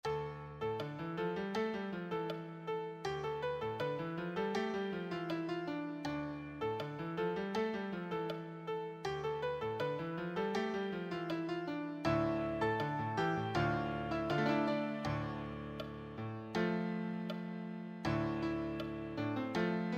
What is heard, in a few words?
advanced solo, advanced piano solo